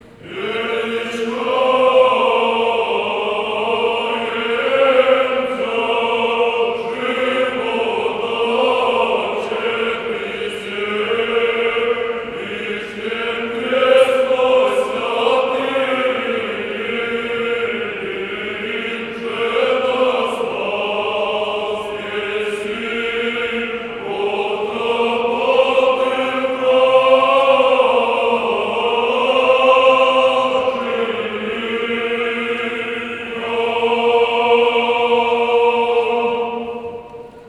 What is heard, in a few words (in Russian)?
Рогожский мужской хор; Вечер Духовных Песнопений 2016; Величание Воздвижению Креста